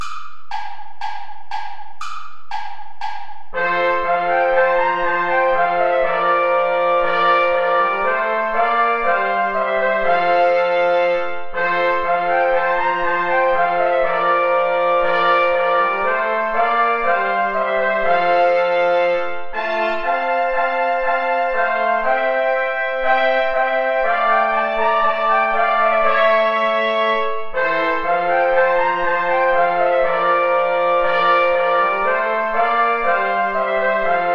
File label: play along without trombone; steuerlein johann; mit lieb bin ich umfangen